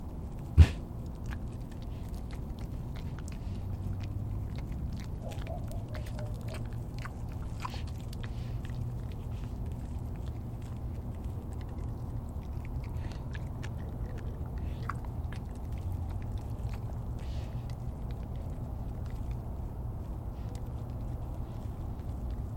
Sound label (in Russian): zvuki pekari